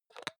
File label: hygiene small cup open medium; haus-garten; badezimmer